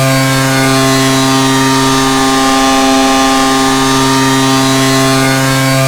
KART EX ON MID

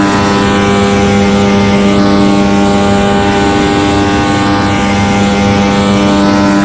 IN ONVERYHIGH; AOS F1 2022

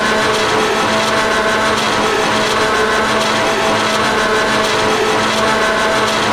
ex CMAXA, F1; AOS F1 2022

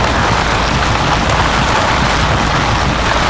grass roll ext wet, Kart Tires; AOS RF1 Kart Demo

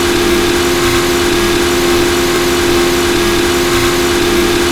in PHIGH, F1; Italy; AOS F1 2022

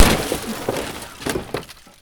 impact wall very light; Kart; AOS RF1 Kart Demo

